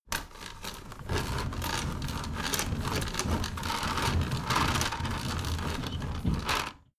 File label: window roller shutter open medium